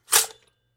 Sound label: Toaster Push Down Mechanism Spring Latch; Foley Appliance; Household; Blastwave